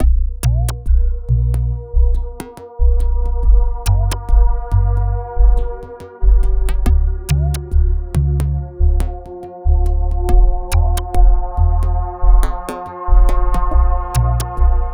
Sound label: Slow Service